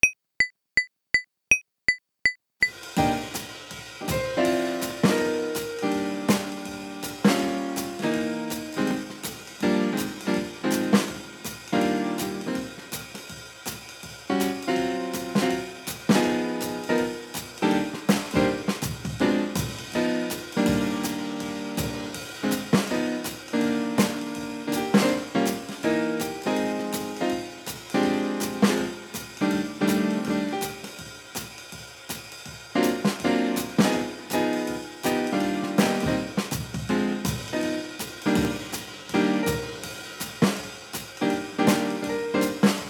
Jazz Improvisation Backing Track NO BASS for bass players